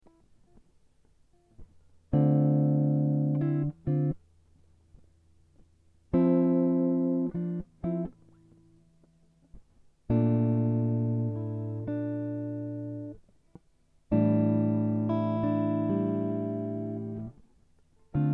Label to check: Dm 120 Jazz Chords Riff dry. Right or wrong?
Right